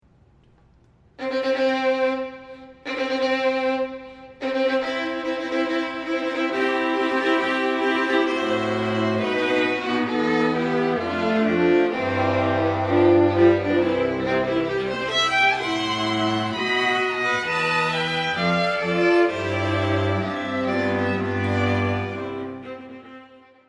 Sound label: Wedding Recess, string quartet; little rock string quartet; Mendelssohn